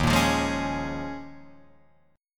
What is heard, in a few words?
Eb 9th Suspended 4th, Guitar, Drop D